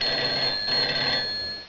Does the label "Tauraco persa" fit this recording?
No